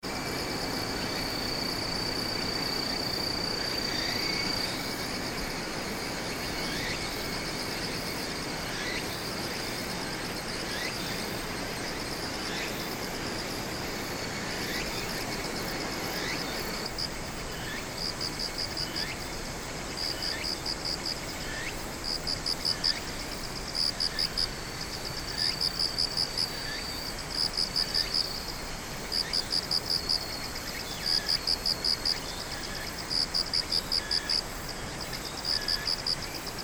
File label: singing of insect